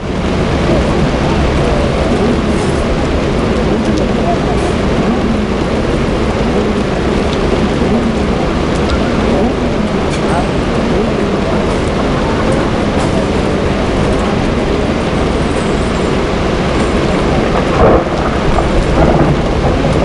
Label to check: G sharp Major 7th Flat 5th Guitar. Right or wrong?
Wrong